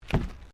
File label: Footsteps Decking Wooden Step; Crocs; Footstep Foley; Human